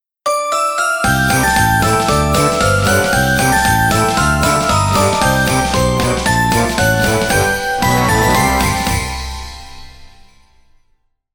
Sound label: comical jingle